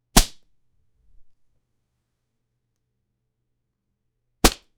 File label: a strong blow to a, human interaction